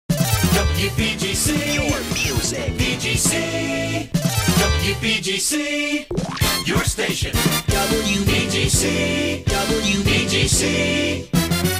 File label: jingles; express pack